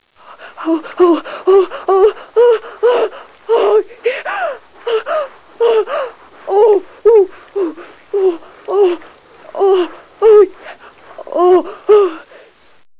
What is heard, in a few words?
circus